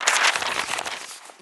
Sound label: book; ridelle